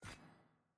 map next turn

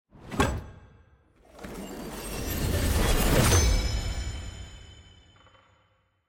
promotion arena wood

